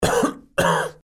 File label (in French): Toux, Maladies; bruitage